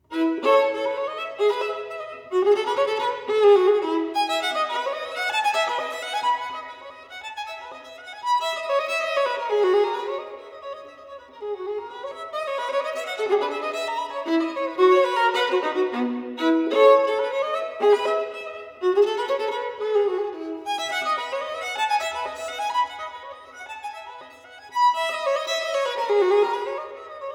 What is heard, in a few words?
Violin Partita No. 3 in E Major, Bourrée; BWV 1006